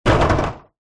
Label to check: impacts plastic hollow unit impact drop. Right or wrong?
Right